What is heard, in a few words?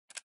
chip